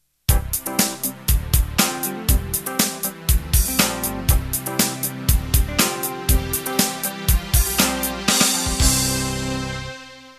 Fusion End

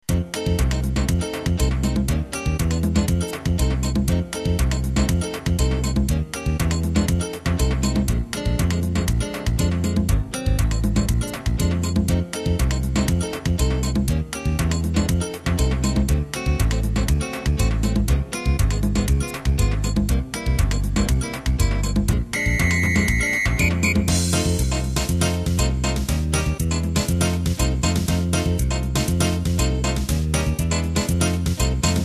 Samba Ballroom

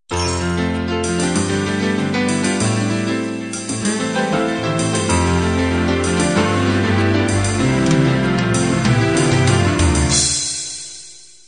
folk ballad intro

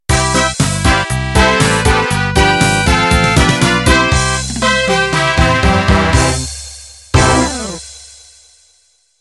Pop Polka End

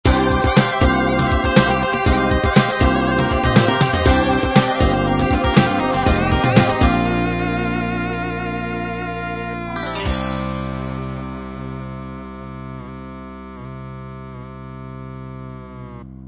fatback groove end

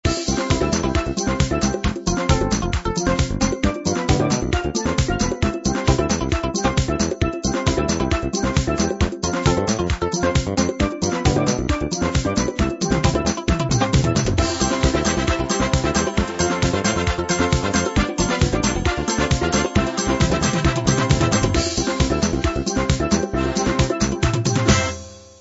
soca

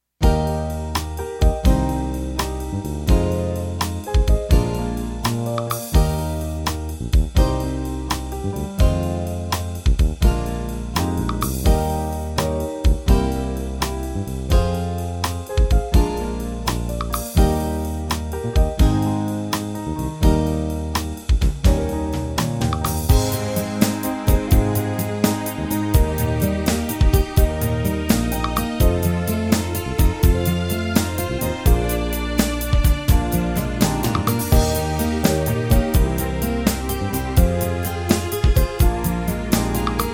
12-8 Ballad

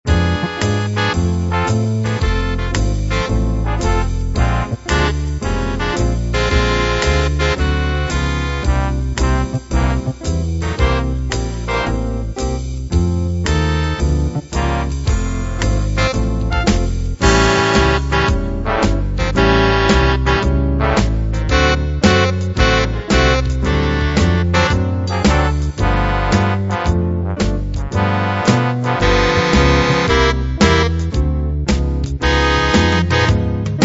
big band

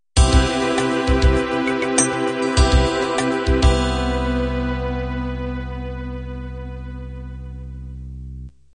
slow ballad end

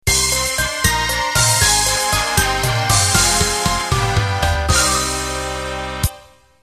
12-8 Pop End

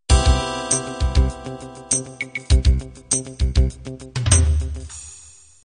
slow ballad intro

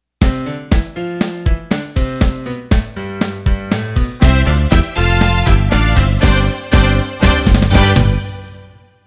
Motown Intro

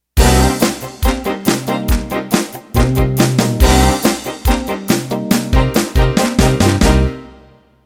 Country Boogie End

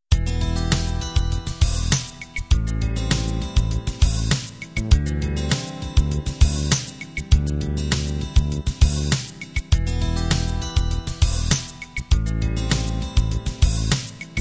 groove ballad

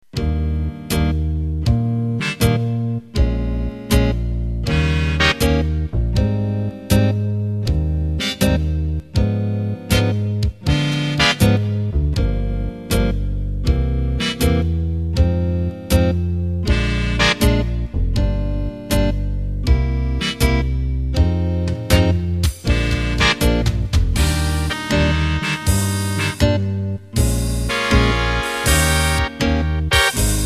Big Band Slow

